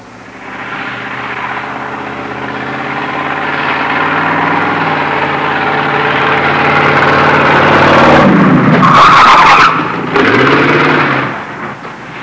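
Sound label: red light, transportation; Web Clip Empire 150,000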